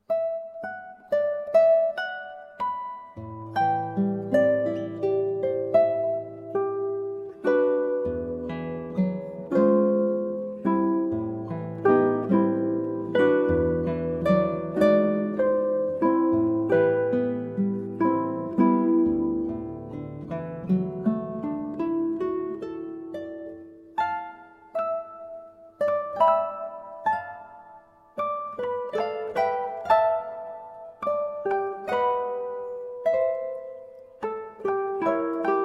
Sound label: Prelude, Guitar and Ukulele; Maurice Ravel